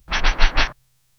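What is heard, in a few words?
a delicate crisp paper rustle; flutter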